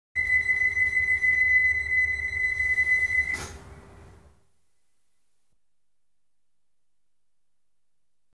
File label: Electric Trains Starting bleep; The BBC Sound Effects Library